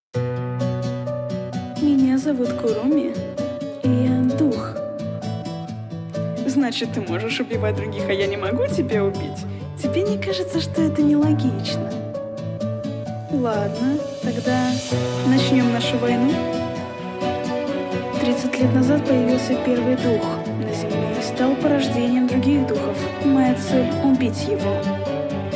rap; tokisaki kurumi

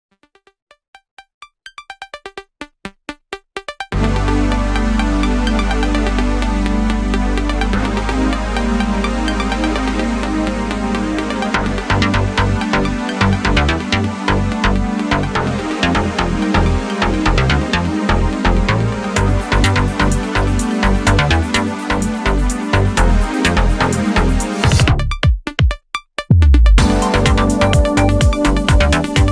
minigame music; ice scream 8 update charlie

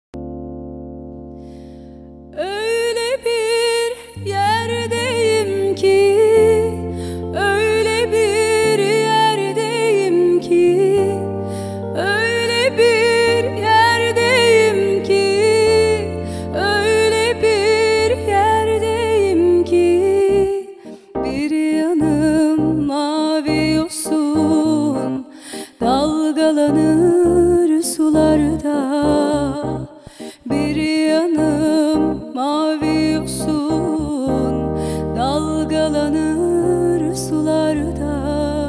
akustik cover

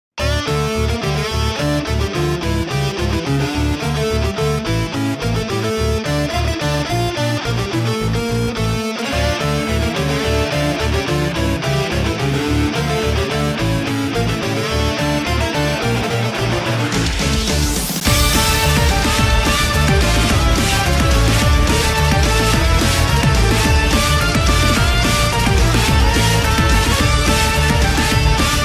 metal; glitchtale

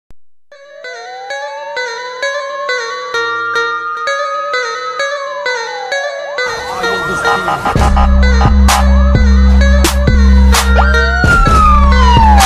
jandarma polis siren remix

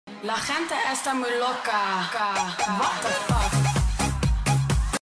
disco club remix; kokand club

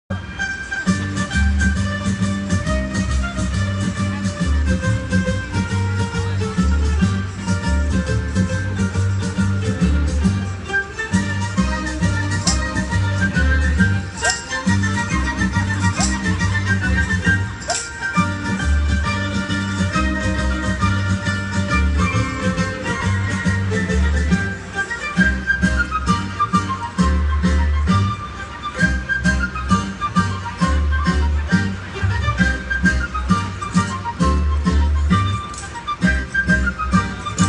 italian tarantella italian folk dance

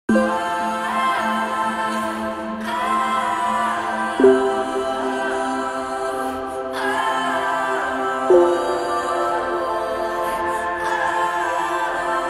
rock cover

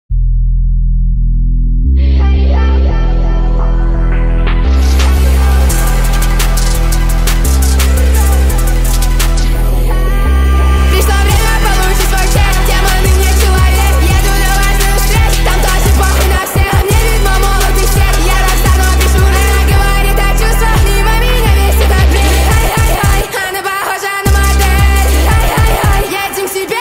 speed up reverb

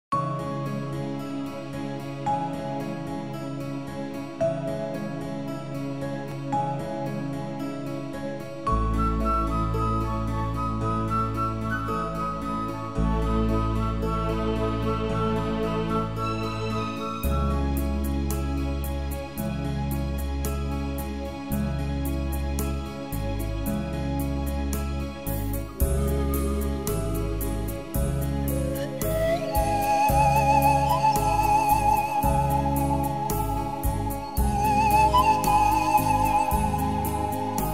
одинокая флейта мелодия lonely flute melody

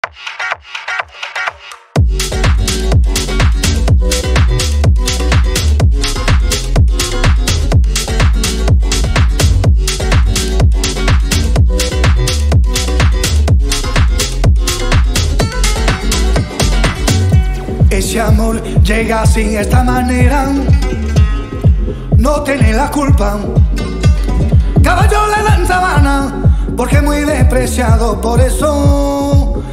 club

